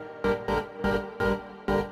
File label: SYNTH INDST 125 A, Industrial Keys